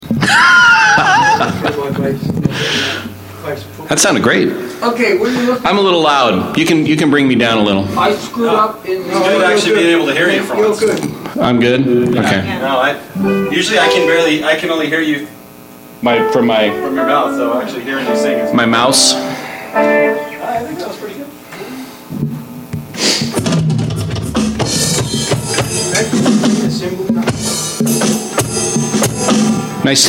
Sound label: Background Mic Mix